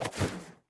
Box Whoosh; Game rip; MiSide

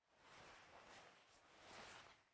Chalk Draw; raw game audio files; Mita; MiSide

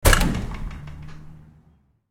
Switch Stop; Game rip; MiSide

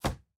Book Hit; MiSide

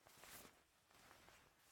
Player Button; Game rip; MiSide

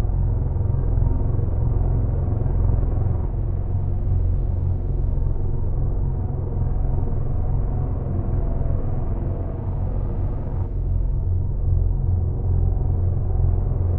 Danger Ambient; Game rip; MiSide

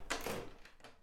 Door Handle; Game rip; MiSide

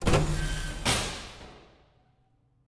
Blowout; Game rip; MiSide

